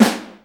SNARE HIT; ORCH.PERC.; Instruments & Percussions; InVision Interactive; Lightware VOL-1

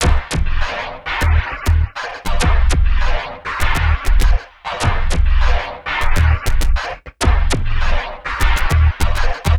Zappy Loop; Sonic Foundry (Sony Creative Software); Esoterik Beatz

